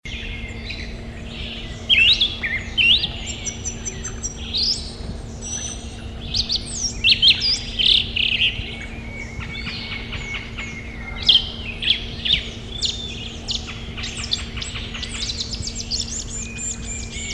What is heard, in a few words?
Turdus flavipes; PE Cantareira; SP; Silveira